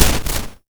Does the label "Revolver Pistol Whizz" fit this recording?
No